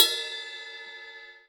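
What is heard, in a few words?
Bell Hardest, cymbal; hydrogen drum samples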